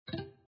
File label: double start; moonshot